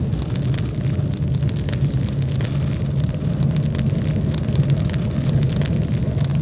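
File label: taxi burn loop; Star Wars Obi Wan; XBOX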